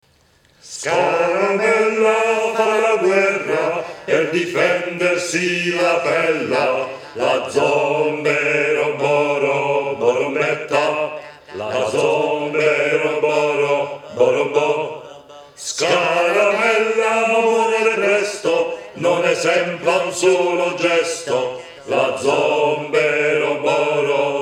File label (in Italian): Rock